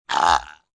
Burp High Bubbly, Burp, high-pitched, throaty (human voice); Burping; VoiceBox